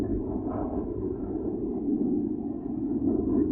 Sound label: thruster; Mindustry